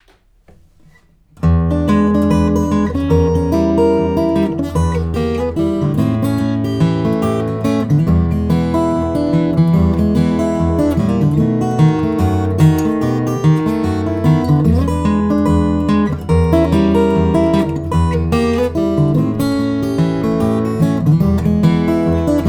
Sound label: Blues